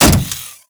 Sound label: Step Default, Footsteps; Warframe; Riot Moa